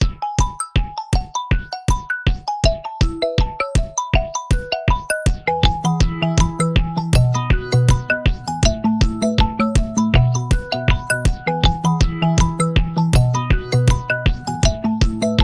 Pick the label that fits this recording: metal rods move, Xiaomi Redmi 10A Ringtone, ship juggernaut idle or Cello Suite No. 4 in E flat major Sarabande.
Xiaomi Redmi 10A Ringtone